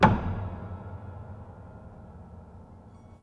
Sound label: Hamm Perc Ped, Piano Hammer Perc Ped